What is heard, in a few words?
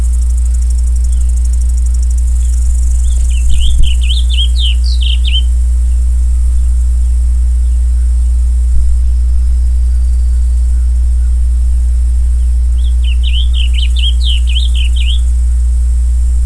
blue grosbeak